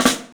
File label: MARFLM, 3D Drums, MARCHIN ROL; 3D Sound